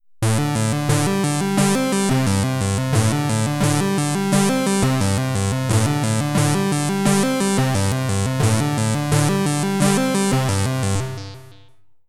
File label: Perfect 4th